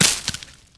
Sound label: bush; Mafie 1